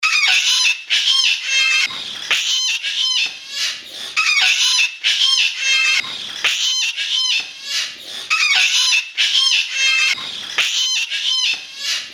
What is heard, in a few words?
Triton Cockatoo, Parrot Calls